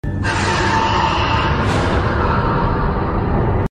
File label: jumpscare sound; fnaf 1 trailer